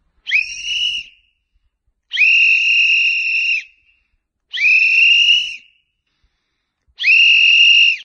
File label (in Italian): ARBITRO, fischietto arbitro metallo